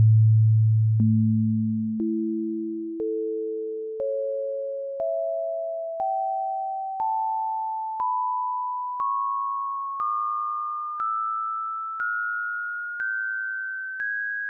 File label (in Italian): armonici